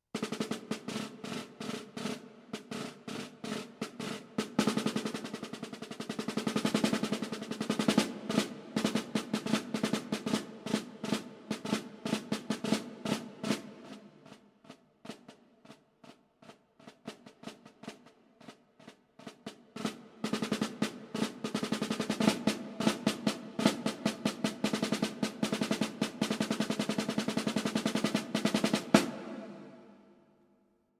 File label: snare drum